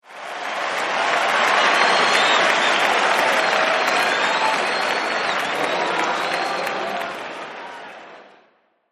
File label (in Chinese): 中等的欢呼; 战中; 战斗音效; nba